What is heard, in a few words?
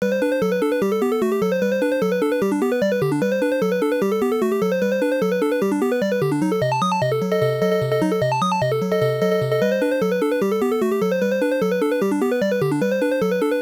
BGM; pachio kun juuban shoubu; turbografx 16